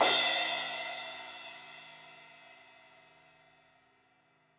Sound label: Crash 16in edge, Cymbals; Deeply Sampled Drums